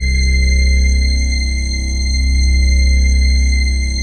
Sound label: PHASE PAD, ATMO PADS; Best Service; XX Large